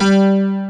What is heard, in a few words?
ELEC GRAND, JV 1080; Pro-Rec - Synthsonic